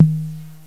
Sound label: Analog Tom; GMPlay; Drums; TG300B